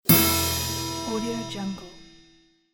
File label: cartoon final bell